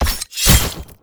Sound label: siren singing attack